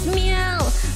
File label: meow